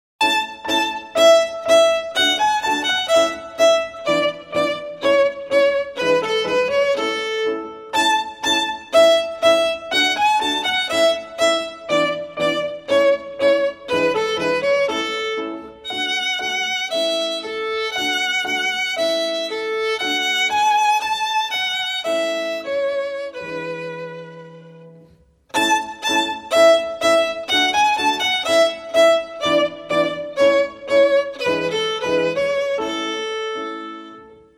Suzuki Violin Method Allegro

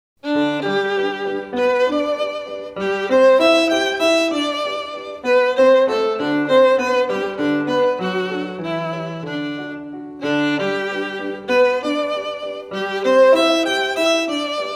Violin, The Happy Farmer; Suzuki Violin Method Vol 01; R. Schumann

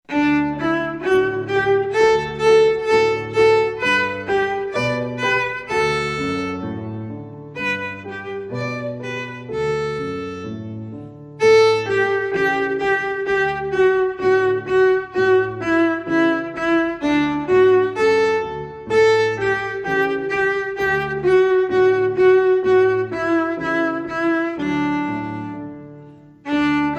Song of the Wind. Folk Song

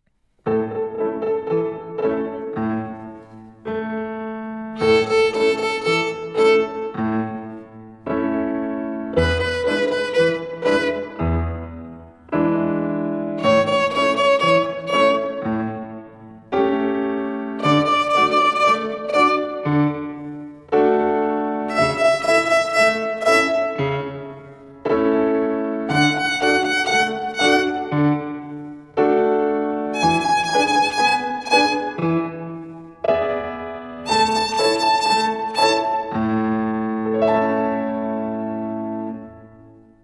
The A Major Scale with Rests

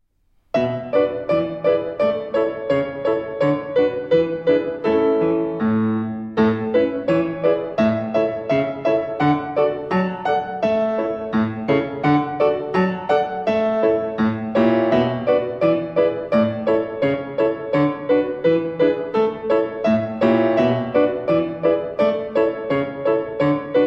Song of the Wind in performance tempo piano

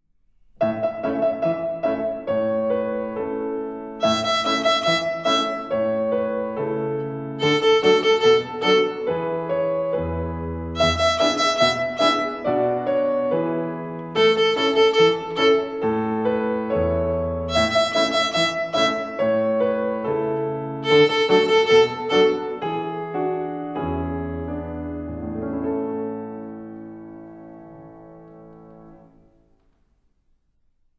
Rhythm on E and A

